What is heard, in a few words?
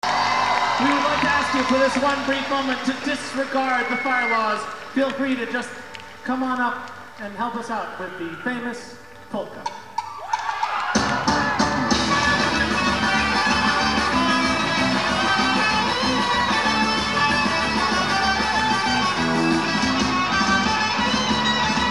Polka